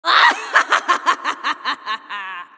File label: witch scary cackle